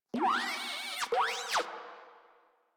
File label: Moa Compassionate Vocal Reward Praise, Pets; Warframe